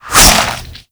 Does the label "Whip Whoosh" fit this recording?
Yes